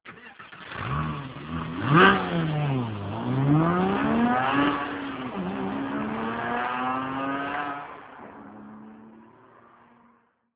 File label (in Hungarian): indítás gyorsítás, porsche 911